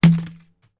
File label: foley paper scrunched up throw hit window